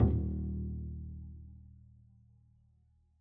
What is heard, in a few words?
pizz eb1, double bass